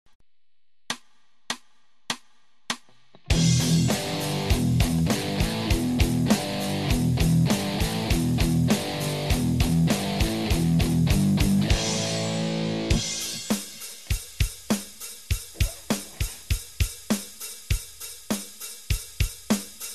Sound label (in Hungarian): rock riffek alap, gitár